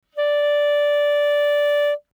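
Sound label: Eb Clarinet fortissimo D4; Woodwinds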